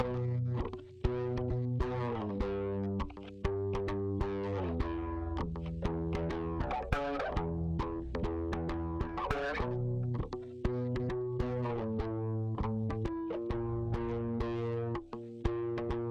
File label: high bass